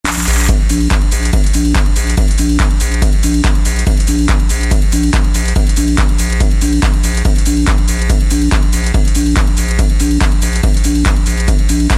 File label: techno